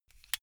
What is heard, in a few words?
car flip key insert